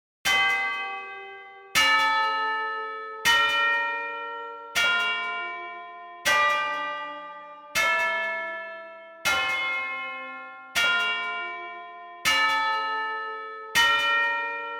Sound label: Glockenspiel